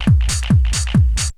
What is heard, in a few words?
Bite Size Beats